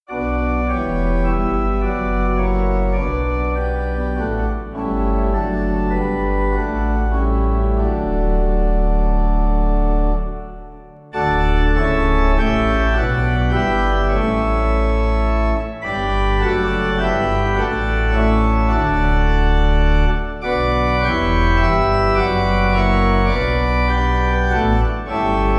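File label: pipe organ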